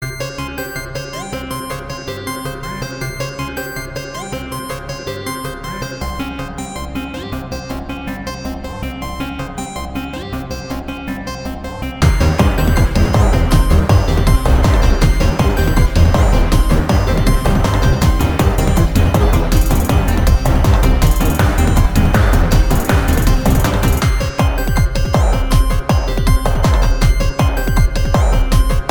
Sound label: Instrumental; Farmed